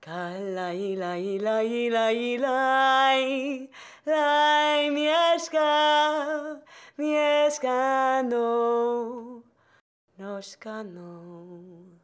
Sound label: Shakra sing rite ending; Hollow Knight: Silksong